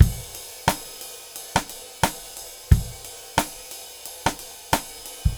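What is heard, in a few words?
89 bpm, beat loops; Zero-G; Monster Beats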